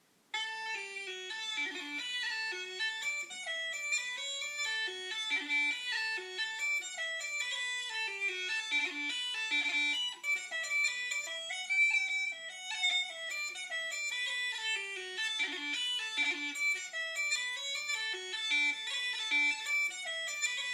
half set

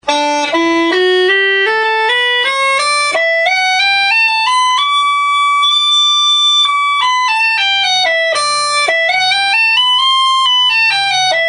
scale, uilleann

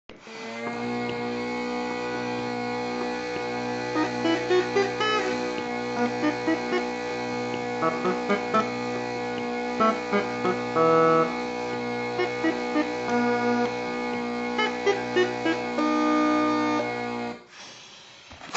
droes & regs

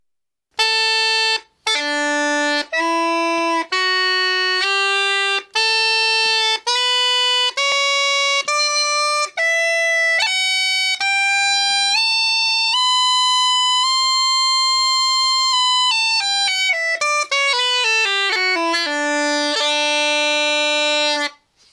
Scale Chanter, uilleann; Charles Roberts

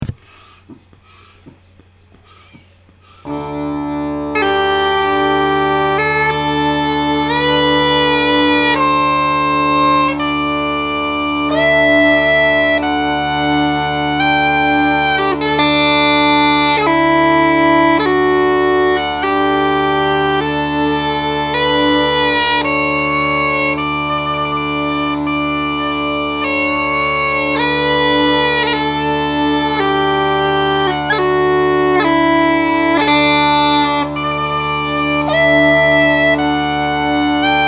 chanter

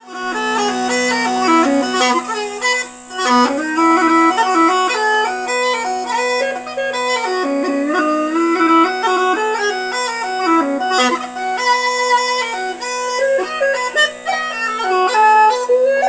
b set; marc van daal

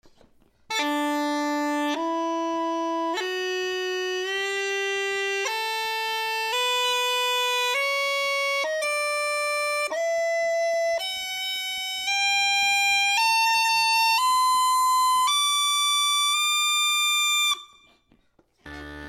Set Sound Sample, uilleann; Larwence

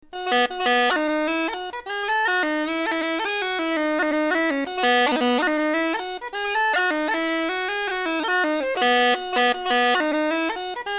b chanter

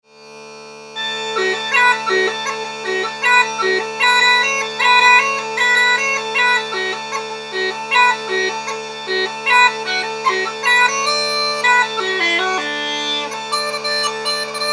irish pipes

